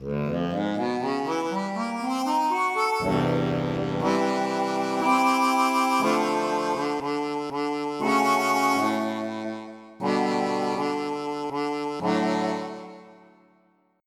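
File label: Vibrato Oct Pad, harmonica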